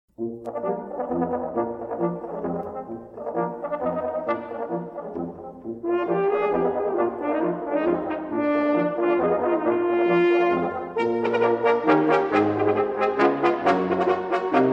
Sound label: trombone quartet